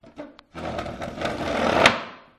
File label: pub wooden chair scrape tile floor; field reports